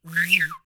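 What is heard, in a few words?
WHISTLE, ANDES; World Voices; ANDES FX; Spectrasonics Vocal Planet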